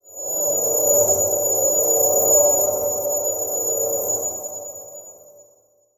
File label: Title Transition Crystal Eerie; Adobe; Production Element